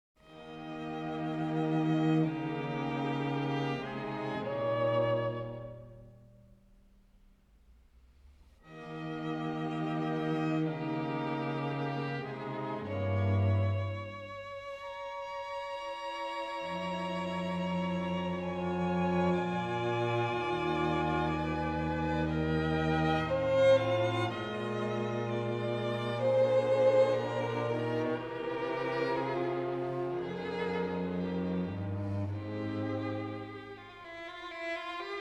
String Quartet No. 10 in E-Flat Major, Op. 74 Harp - I. Poco adagio; Ludwig van Beethoven; Beethoven - String Quartets Nos. 1-16